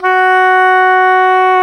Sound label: oboe; Roland LCDP04 Orchestral Winds; Oboe Brth 1